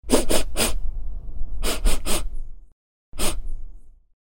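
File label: person sniffing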